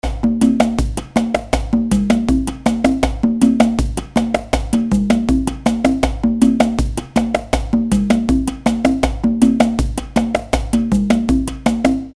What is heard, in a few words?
Bomba Conga